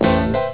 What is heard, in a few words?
magic, beeps